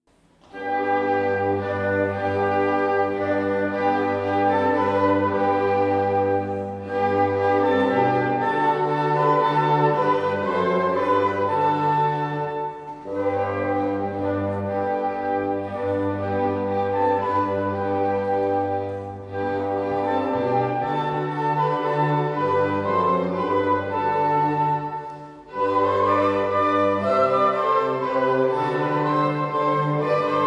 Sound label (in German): Menuett, Ensemble; Sommerkonzert 2019; Menuett III aus Feuerwerksmusik; Ensemble variabile